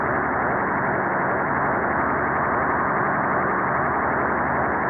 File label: machine buzz; cstrike; deathjourney